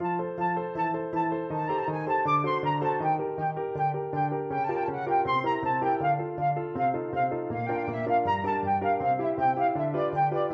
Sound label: carnival finale flute